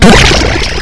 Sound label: hit by something; Macworld (1998-10); Games World; Shareware Games; Arcade; idin 2.0